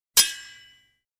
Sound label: Sword blow; cold weapons